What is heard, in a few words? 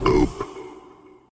boop